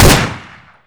new ump; garrysmod

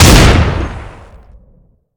shotty; balrog

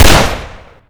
usp match; garrysmod

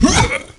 swing; garrysmod

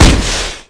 rifle; garrysmod

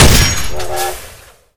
nail gun; garrysmod

